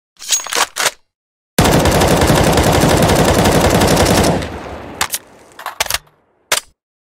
m4a1, zvuki opuzhyia; csgo

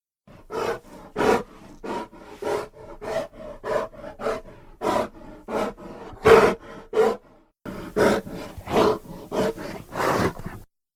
grizzly

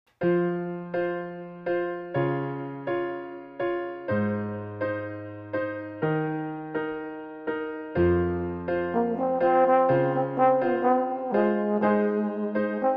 trombone

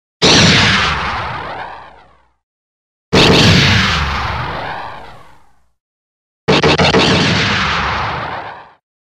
blaster; millennium falcon

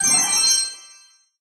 get coins

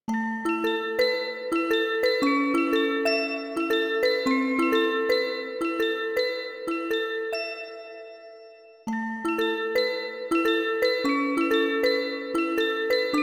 home music box; undertale

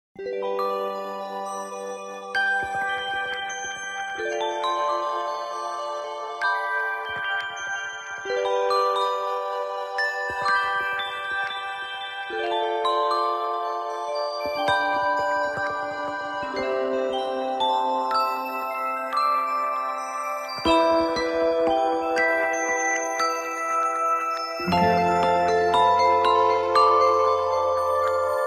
cosmic call

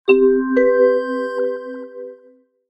sms

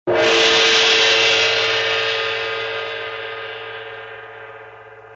gong

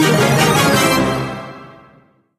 laser upgrade char

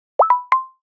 scoop, apple watchos; apple watchos 11

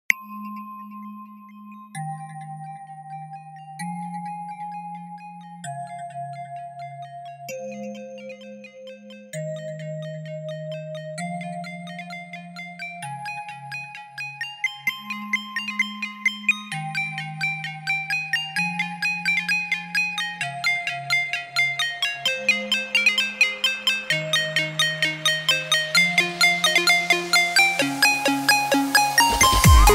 8 bit music

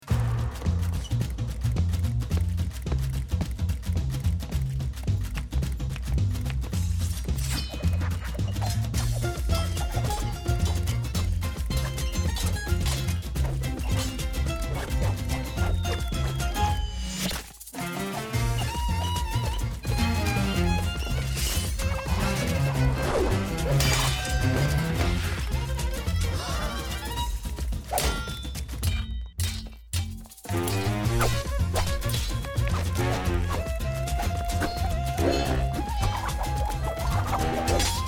mazurka

